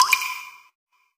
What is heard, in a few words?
water